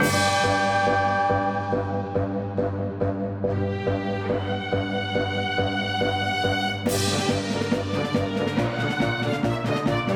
ingame generic (1st + 4th Layers); wicked witches

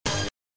pickup tone; global gladiators; Amiga

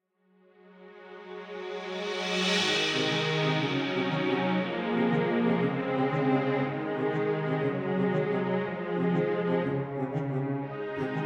Victory; bastard bonds

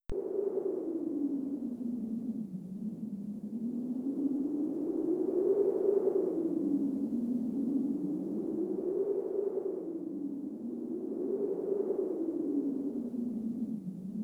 Lobby Ambience

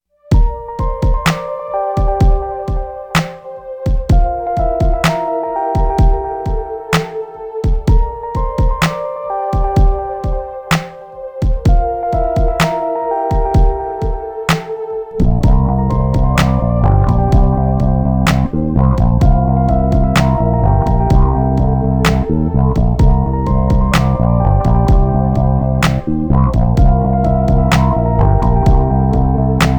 Instrumental; Pumped BMX

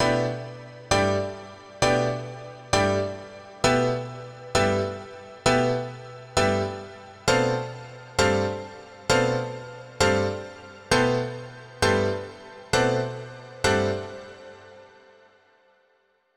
Dance Piano

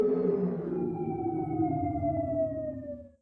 Event Failed

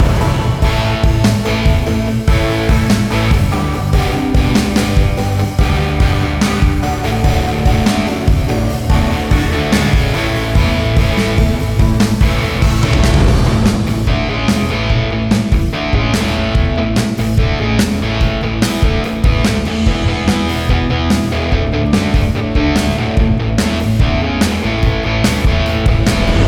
Mini Boss Celebration Loop; Chillheim

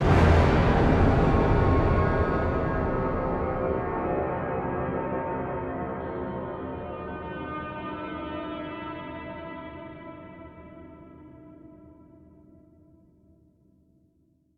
Stingers - Scary; Planet Alpha

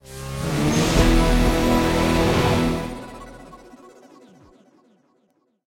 Completed; Rocksmith

